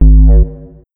Horn Womp

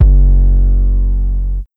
Kick 808

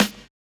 snare drum

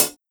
Hi Hat Closed; BJA Pacific

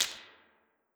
keys; foxdot default

